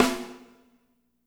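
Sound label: piccolo snare; Spectrasonics; Burning Grooves CD2; PICC. BALLAD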